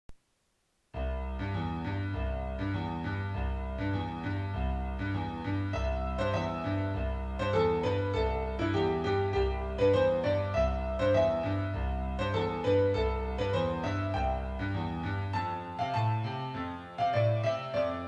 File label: rock; baby rock